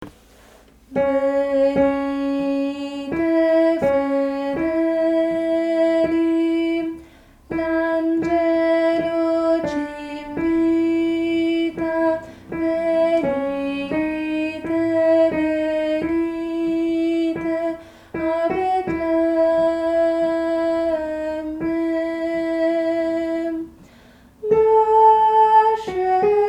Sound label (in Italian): venite fedeli contralti